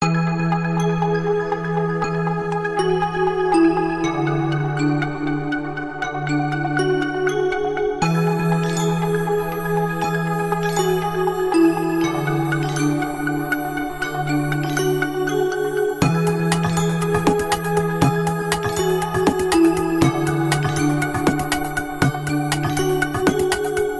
Huawei Ringtone

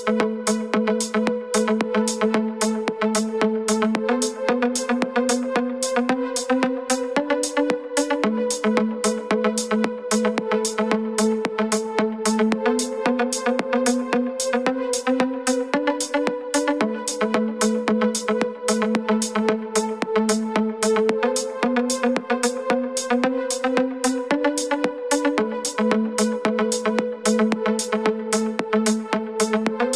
Ringtone; Samsung Sensiol 2021

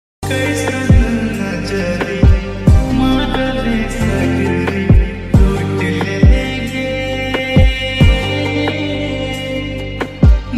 Tamil Love Sad Ringtone

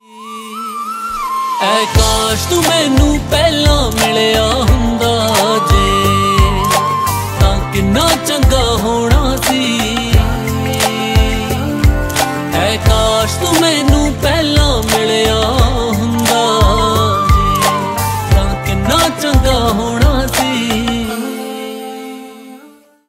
Punjabi Song Ringtone

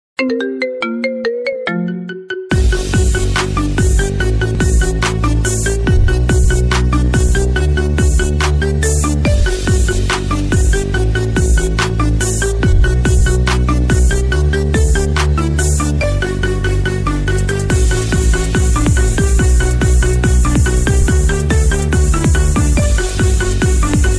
Marimba Ringtone

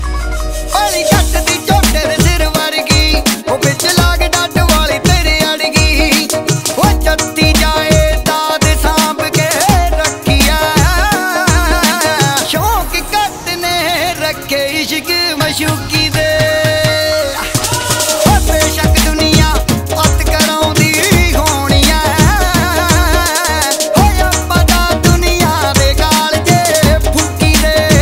Punjabi Song Ringtone

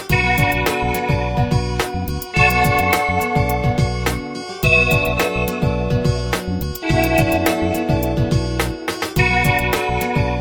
Vivo S17 Pro official ringtone

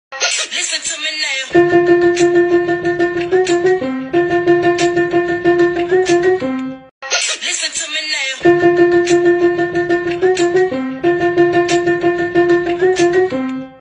Trending English Song Piano

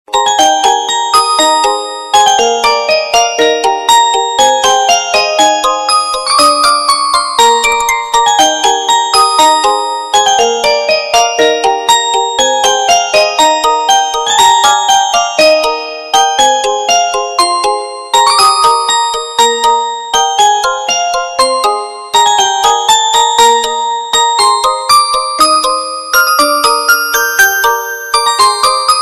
Cute Melody Ringtones

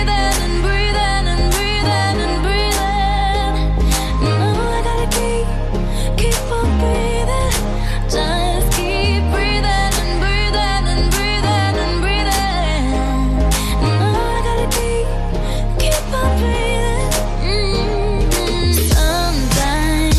ringtone

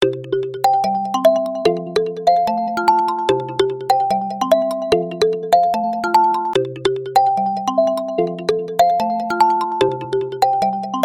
Xiaomi Redmi Note 12 Pro Ringtone

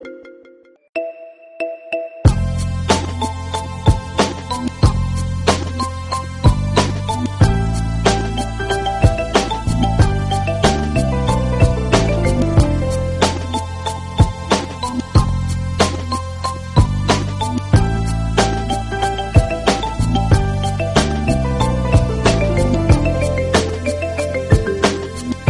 marimba

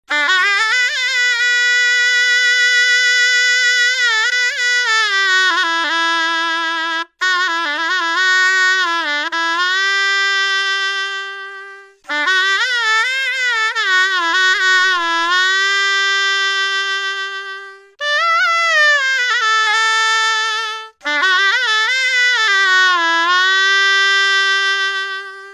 Shehnai ringtone

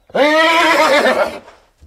Horse Nicker Ringtone

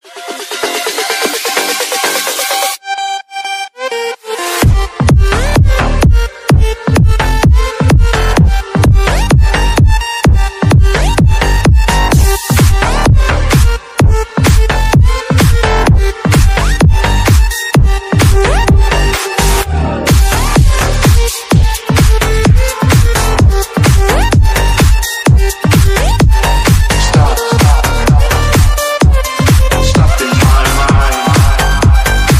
Remix Instrumental Ringtone

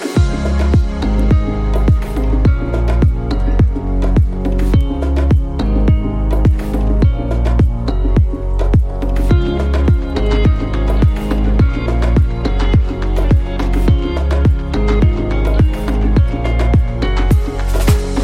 Huawei Caller Tune

